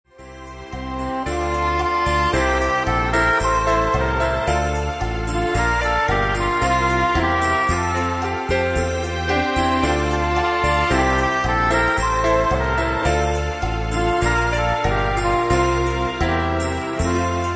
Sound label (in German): zwischenmusik playback